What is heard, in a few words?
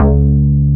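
JUPITER, Synth Bass; SYNTH-Basses; Sound & Vision; Gigapack I CD 2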